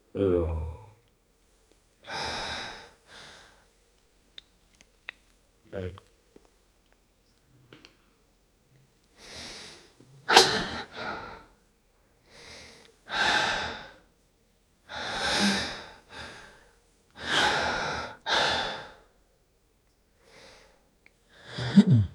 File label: years old man holding, close mouth